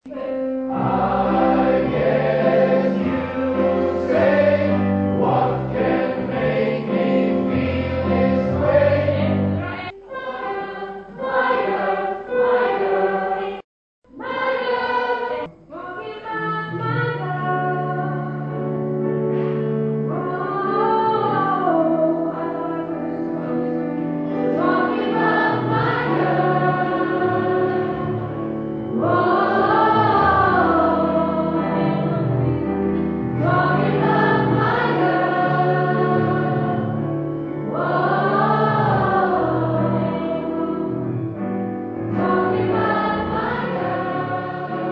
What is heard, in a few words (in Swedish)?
bas